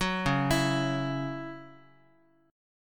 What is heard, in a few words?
Db Minor Double Flat 5th Db Guitar